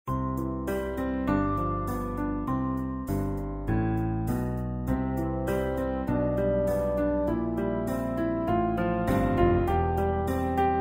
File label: scaling up c major backing track